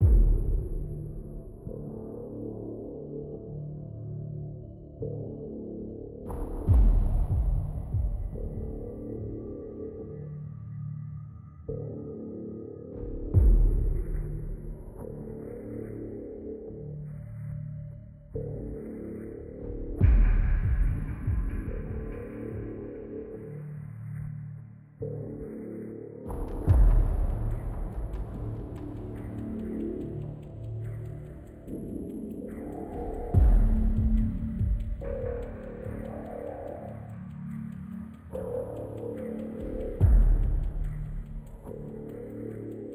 Explore Suspense

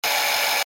Drill; Troll Face Quest Unlucky; Android

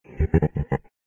laugh; Five Nights at Ronald's

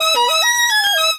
Beat

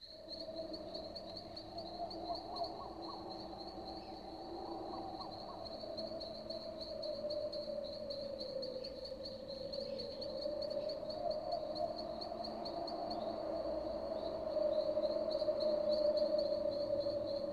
Wind Plus Loop; skykeepers; ps4 windows xbox one gamerip 2017

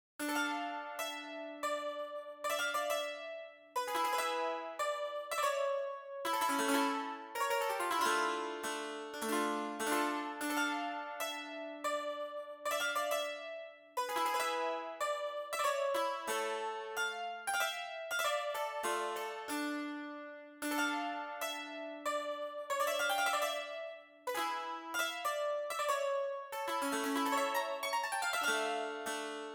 Dulcimer Melody; Factory; Skylar & Plux: Adventure on Clover Island

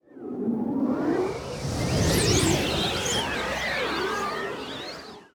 Wind; Se Solo Wind 01

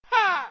grunt; Becca

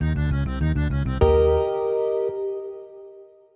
end stinger; SpongeBob Patty Pursuit

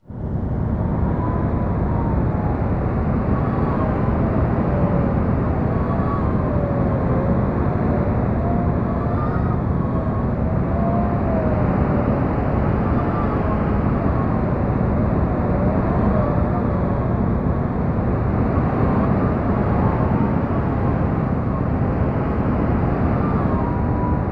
Mountain Outside Ambience; Dinosaur Planet; N64; Warlock Mountain

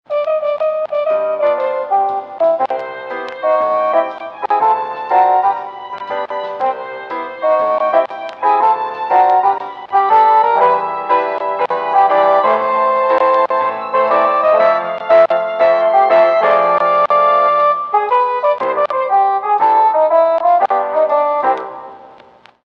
Stage Music; mata hari windows gamerip 2008

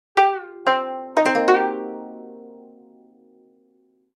Dialogue Box (Gayageum); B Path of the Teal Lotus

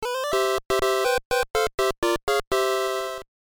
Fanfare (Arranged); makai toushi saga wonderswan color gamerip 2002

